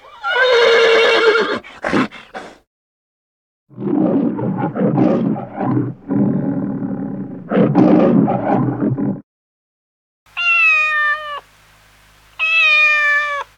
horse lion cats; animals; best service Blue Box

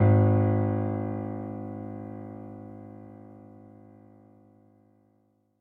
Exit Game Fm Ab; DeadFright

